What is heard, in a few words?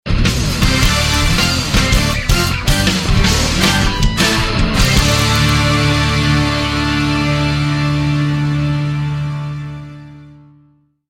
stage clear